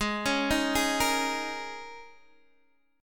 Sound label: Ab Suspended 2nd Suspended 4th Ab down Guitar DADGAD